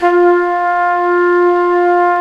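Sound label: WND, Hybrid Winds, Flute & Flugel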